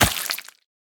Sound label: banana hit; smite; raijin